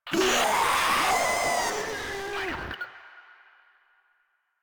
Vocal Attack; Warframe; Proto Infested Runner

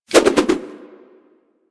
Nunchaku Spin Whoosh, Weapons; Warframe; Tenno

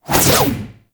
Backflip Whoosh; Enemies; Foley; Generic Foley; Warframe; Duviri; Dax

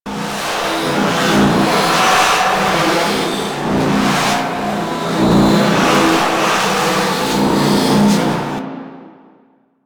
Lair Gravity Stream Loop; Warframe; Sentient Lair

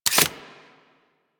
Uzi Pistol Mech, weapons; Grn Uzi Pistol

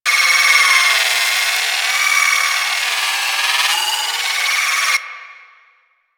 Hub Pillar Loop; Ambience; Props; Warframe; The New War; Zariman; Quests

